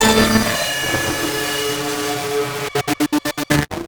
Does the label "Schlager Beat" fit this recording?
No